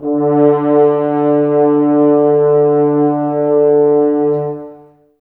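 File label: Brass French Horns, Woodwind Brass Ensemble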